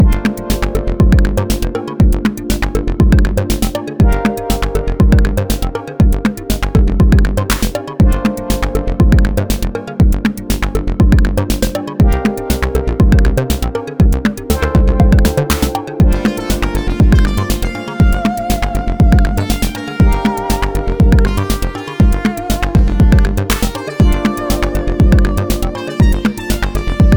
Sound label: panettone dialtone